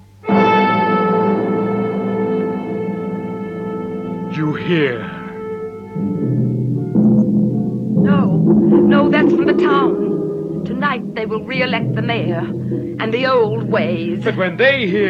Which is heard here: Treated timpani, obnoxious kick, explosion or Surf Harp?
Treated timpani